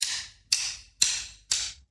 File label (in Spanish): Palos Bateria